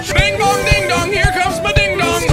bing bong

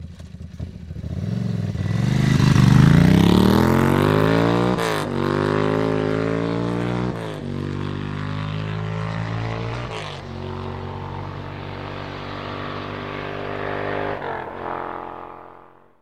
Ducati 900 Pantah

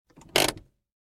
Emergency Brake

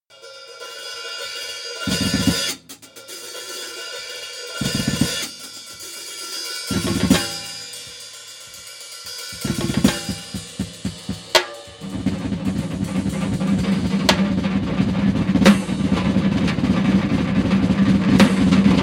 Ripple Drum

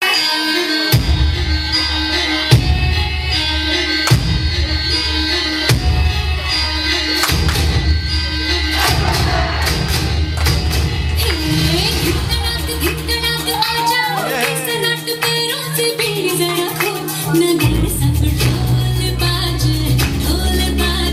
dhol baje

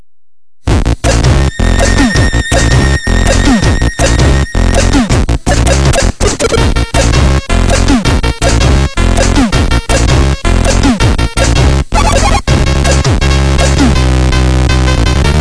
Threshold 8bit

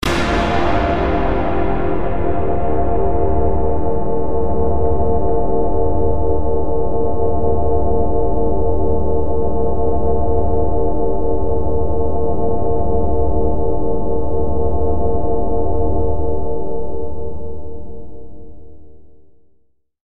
Metalizador Effect

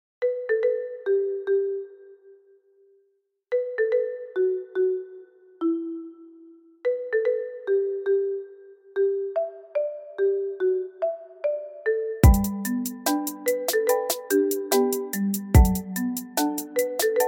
Trap

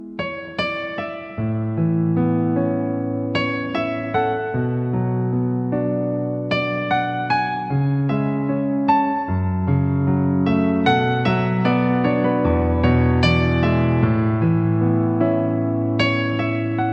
Sad Piano

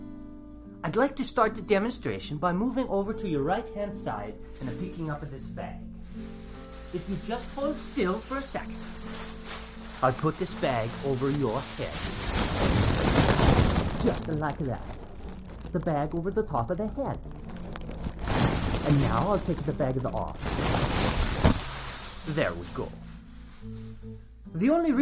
Plastic Wrapping

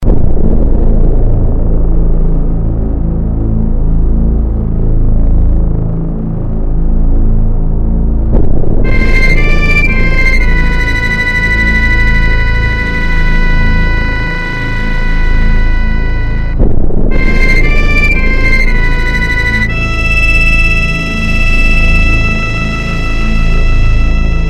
Cave; Great Northern

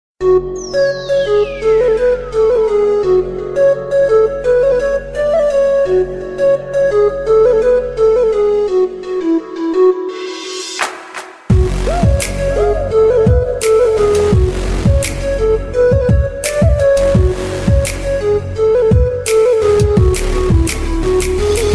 arabic tune

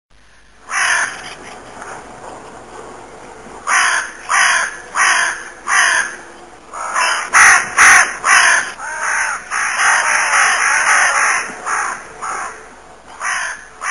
Crow Ring

